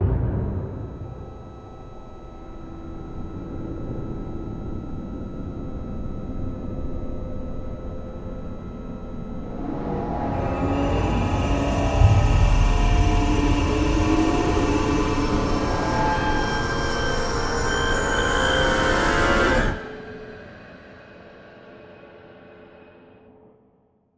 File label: In Suspense